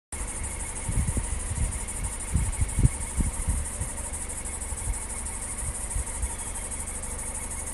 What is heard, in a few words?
strange engine noise, hyundai accent; strange engine noise please help me diagnose issue